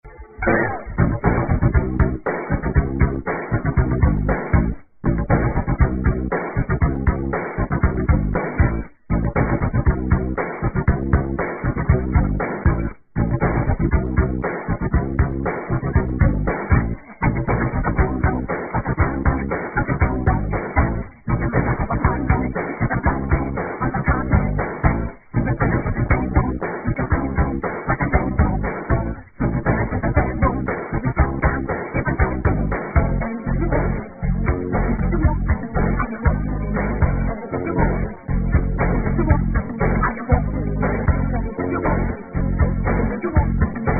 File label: Low Quality